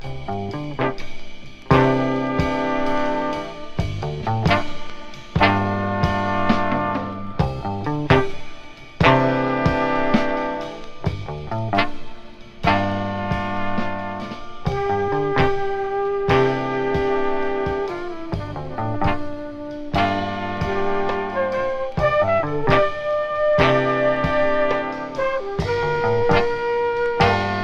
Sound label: horns, Phonky loops